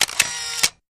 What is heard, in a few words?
35mm Camera Auto Adv